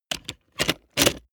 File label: plastic mechanical handling lock